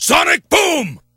Sonic Boom

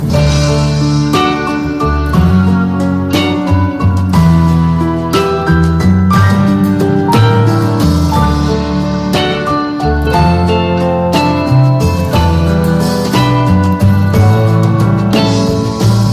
Looping

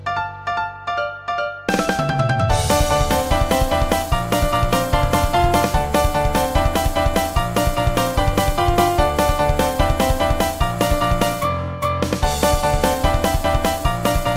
Piano Theme; SVTFOE

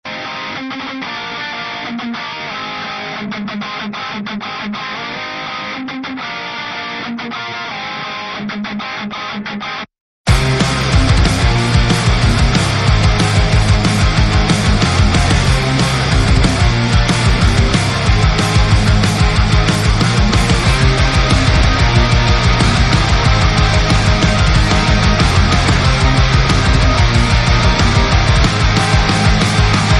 Metal; Deku

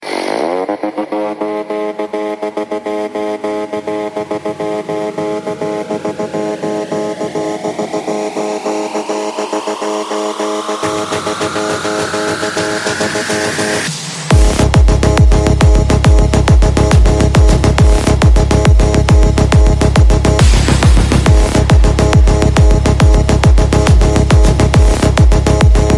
sound psy trance

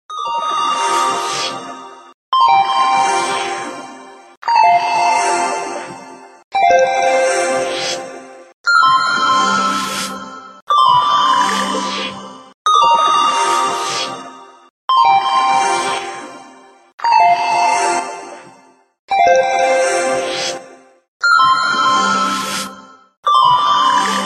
3d Alarm Samsung; Samsung2013

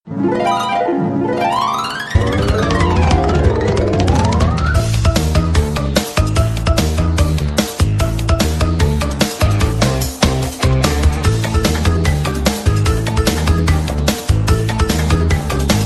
Warped Tri - Crash

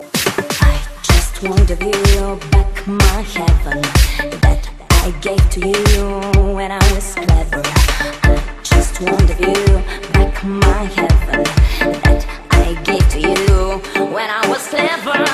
Elektrik

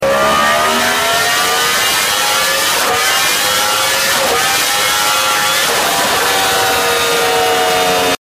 Yamaha-R1

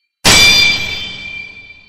Deflect; Sekiro

